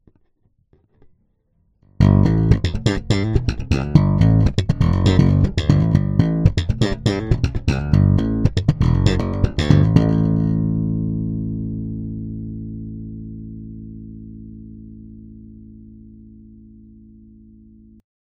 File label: SLAP